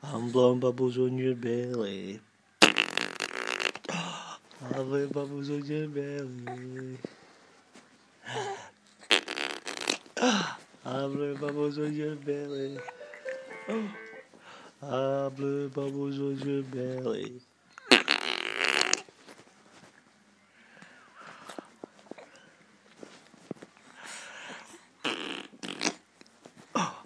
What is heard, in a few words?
blowing bubbles on your belly